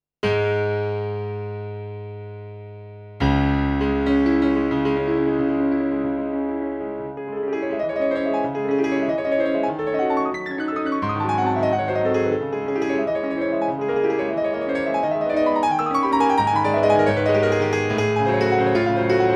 piano classique; kurzweil pc361

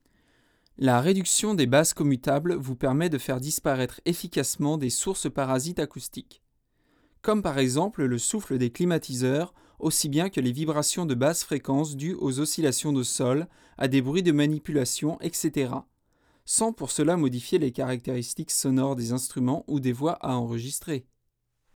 voix pad; akg 214

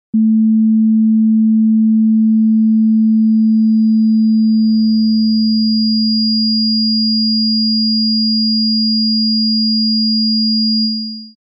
harmonique

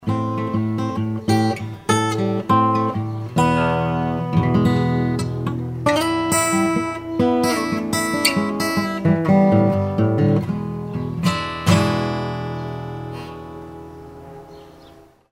guitare; nagra; ares-m; capsule panasonic; denfert

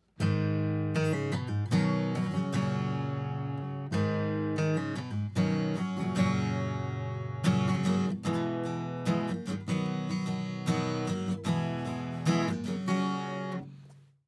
guitare acc c414 preamp et compresseur; rupert neve portico

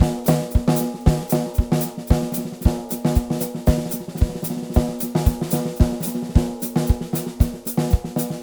4 micros groove, batterie en boîte; un micro tom en plus sur la grosse caisse; audioctone km-8p; kit de micros de batterie; gc proche peau

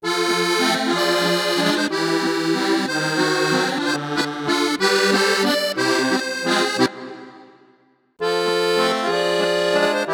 tyros3 sound accordeons, yamaha

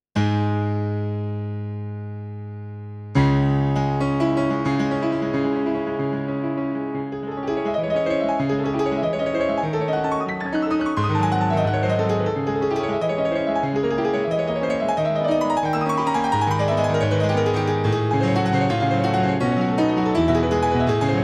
piano classique, yamaha tyros3

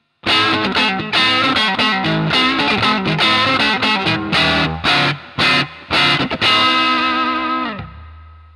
bassman avec fuzz one; ik multimedia amplitube hendrix

